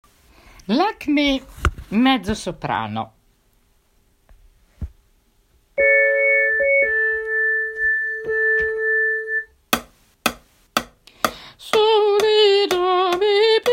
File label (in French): lakme alti; Duo des Fleurs de Lakmé